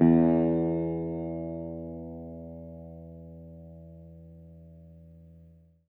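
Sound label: electric sitar soft; Quantum Leap - Guitar & Bass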